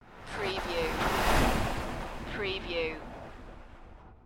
Skiing, Snowboarding, Snowboarder or skier pass by fast, left to right